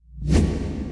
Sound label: DASH; zoochosis whale